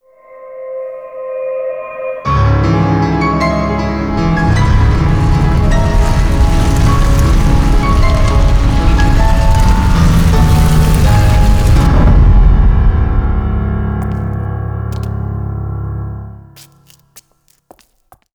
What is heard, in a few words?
Main Theme Piano Version; Death by Degrees; PS2